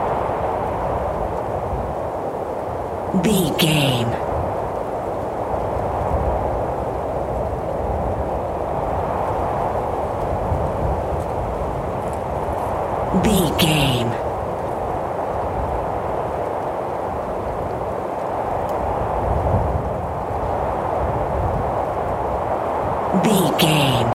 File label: wind general gusty low loop